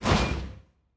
Door, Sci-Fi Sounds

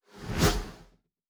Fly By, Sci-Fi Sounds Movement

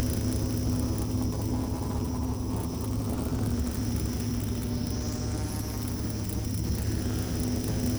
engine loop, sci-fi sounds, mechanical

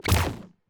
Weapon Shoot; Sci-Fi Sounds

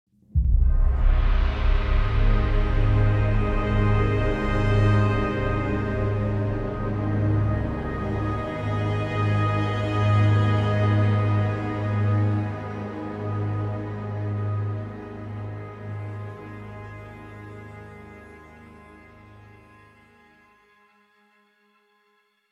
Opening, Classic Horror Sci-Fi Music Pack; Opening 3 (26sec); Dead Ark

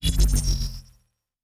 Shield Device Stop, Electric; Sci-Fi Sounds

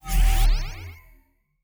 Teleport, Sci-Fi Sounds

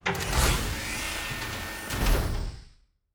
Door, Sci-Fi Sounds

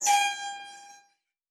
Metal Foley Creak

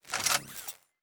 Weapon Reload (Laser); Sci-Fi Sounds

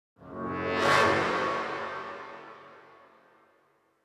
Suspense Transition, Horror Sci-Fi Music; Dead Ark

